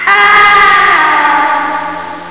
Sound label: falling; Sound Library 2000; Wayzata Technology; People